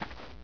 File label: step, hgrunt; Source Engine; bshift